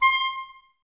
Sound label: clarinets, Wood Winds